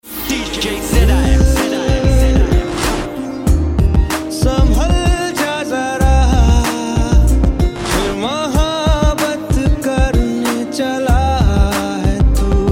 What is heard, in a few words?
hindi new shiva mix ringtone; Navratri Special